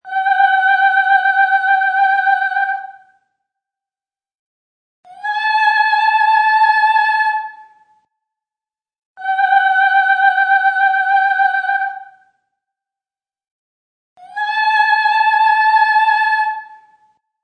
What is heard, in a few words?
Opera Woman; Human Vocal Ringtone